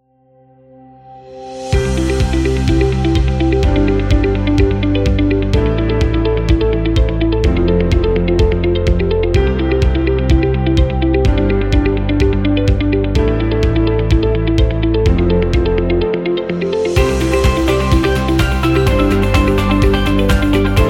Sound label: Uplifting Corporate